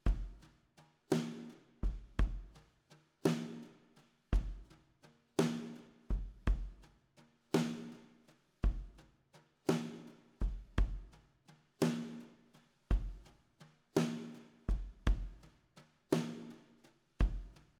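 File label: Little Plate Drums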